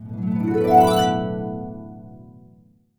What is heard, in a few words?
B MINOR 11, HARP; AKAI S-Series CD-ROM Sound Library VOL-2; HARP GL